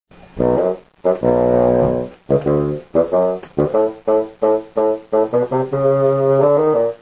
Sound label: bassoon